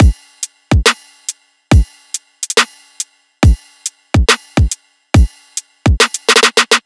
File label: Riddim Drum Loop Full (140)